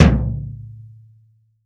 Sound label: BD, REAL DRUMS, JUNGLE KIT